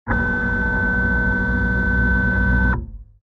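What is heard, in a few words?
3D printer finishes printing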